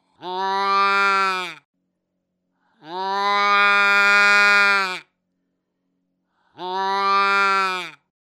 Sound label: Doe In Heat, Deer; Dave Kelso